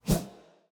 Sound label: Ui Map Menu Close; portal quest android